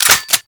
Reload, Weapons; Strun

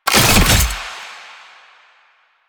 Fuel Projectile Pick Up; JadeShadows; Ascension

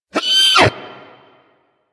Snow Critter Vocal Pain

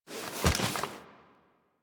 Idle Spin; Warframe; Yareli

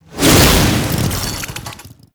Infested Hammer Whoosh, Weapons

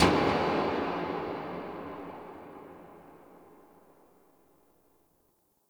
Creepy Metal Swell Clank; Warframe; Ambience; Entrati; Dante Unbound Leverian

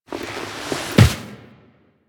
Stand Up Foley; Call Of The Tempestarii; Wraith Quest; Reaper On Bridge

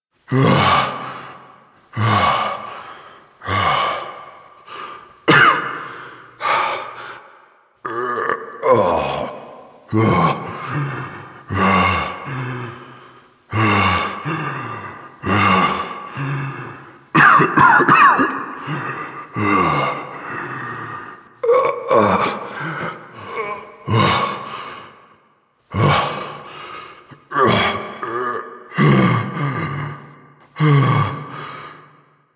Breath Limping Loop; Warframe; Quests; The New War; Kahl; Breathing

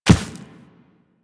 Run Dirt, Footsteps; Warframe; Operator

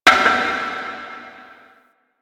Metal Debris; Ambience; Warframe; The New War; Quests; Zariman

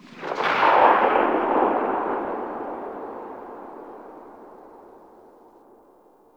Cannon Tail; Grineer

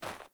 Sandals Run Snow, Footsteps

